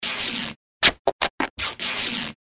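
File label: bandage tearing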